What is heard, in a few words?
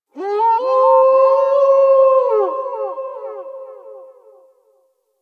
Legendary Kubrow Lift Away; Warframe; Hunting Critters